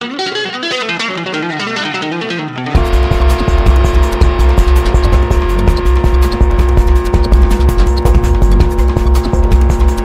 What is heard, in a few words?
e-jam tech; 50er